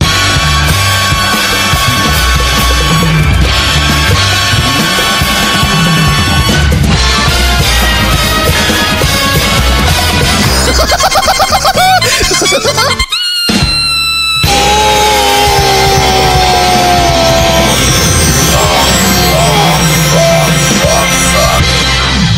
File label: squeaky flute funny